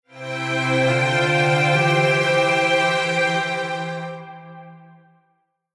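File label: Strings Polyphony Harmony TB; Poly Hamo TB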